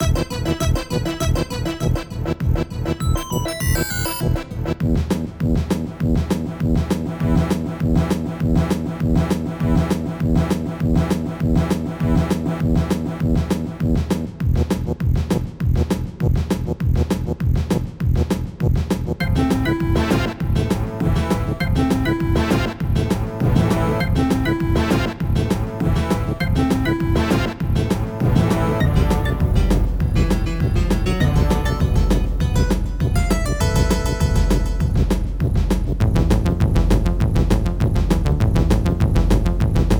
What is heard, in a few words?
battle